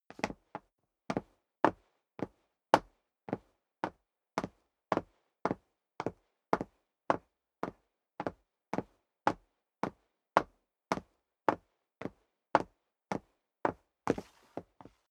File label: step sneaker wood parquet upstairs sequence; schritte